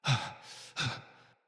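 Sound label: Cry Heavy Run, Barks; Battle; Envoy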